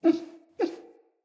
Wolf Cub Sleep Idle Yip, Animals; Vocals; Orengal Wolf Cub

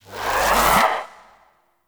Amplifier Chassis Charge Up; Weapons; Operators Amp Chassis Part A Charge Up C; Warframe; Amplifiers

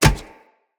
Kubrow Step Metal; Footsteps

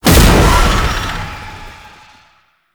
Cast Impact; Warframe; Uriel; Demonium

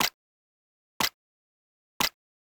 Weapon Timer; Warframe; Caliber Chicks Minigame; Pick Ups

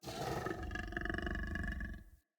Wolf Idle Grunt; Animals; Vocals

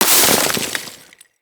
Melee Impact Snow

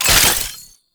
Wrist Gun Reload; Warframe; Tenno; Incarnon

